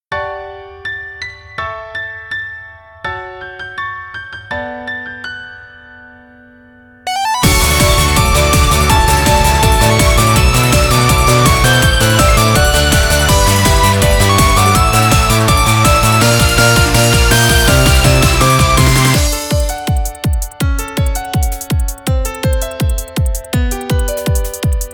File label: Instrumental